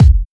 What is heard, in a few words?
Soft Kicks; Vengeance Samples